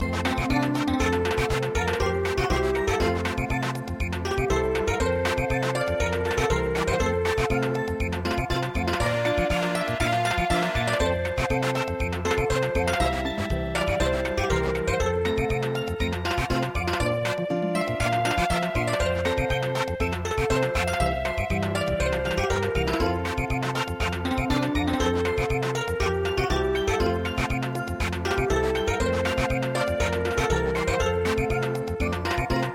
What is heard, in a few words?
Samba, traditional; midi